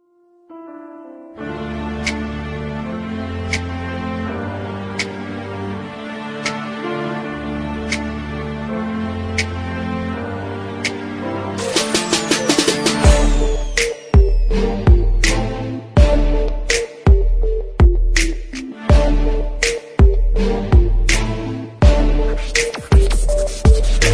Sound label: freestyle beat instrumental